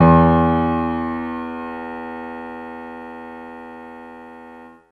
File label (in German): klavier e6